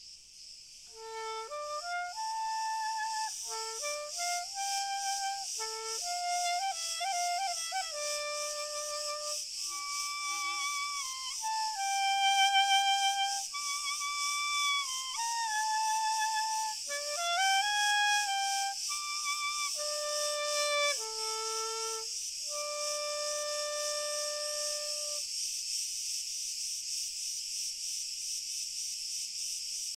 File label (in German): Stand Synonym O Ton Spiel Grillen Chor Cl Fern